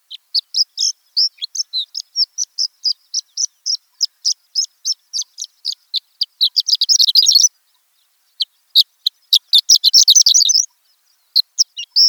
Horned Lark

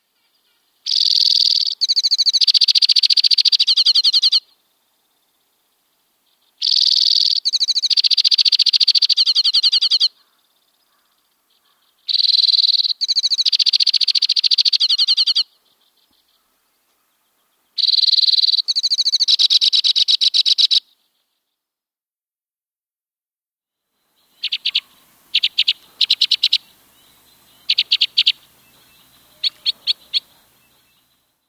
White-winged Crossbill

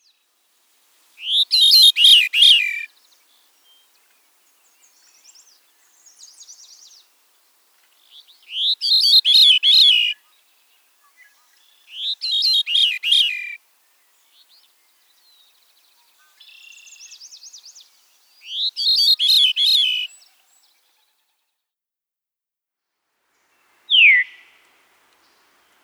Veery

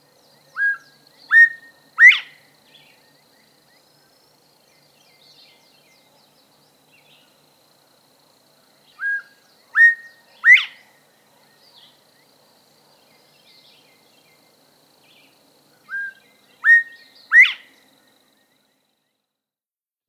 Northern Bobwhite

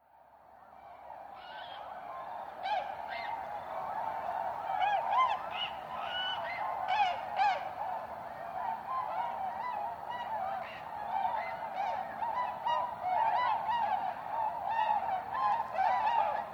Tundra Swan

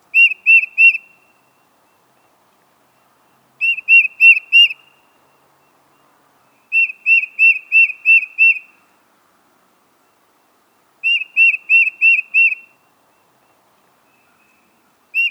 Tufted Titmouse

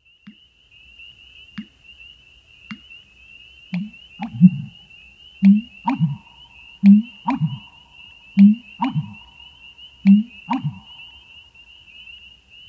American Bittern

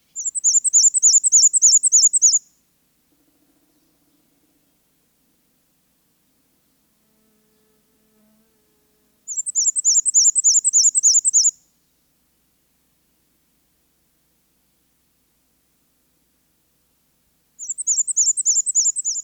Black-and-White Warbler

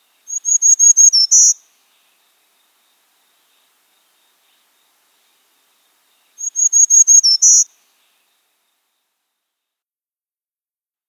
Black-throated Green Warbler

